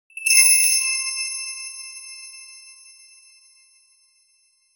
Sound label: soft cute surprise sound